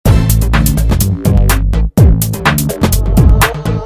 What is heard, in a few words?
Loop